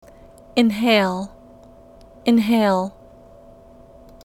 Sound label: inhale